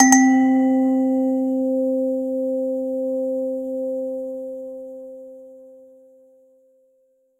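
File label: create a series of chicken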